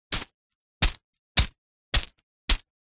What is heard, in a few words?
foley stones pebbles 5x hits impacts thuds